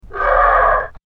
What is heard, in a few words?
pain, dragon white tiny